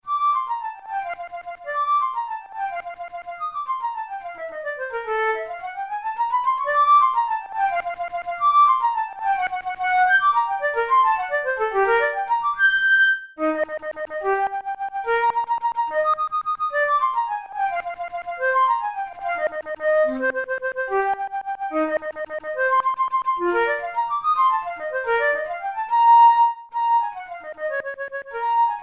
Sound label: studies for the boehm flute; drouet 72